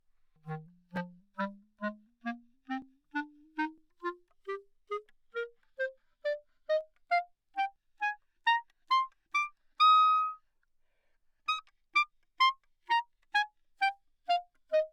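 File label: clarinet bb staccato, modern clarinet; OPENAIR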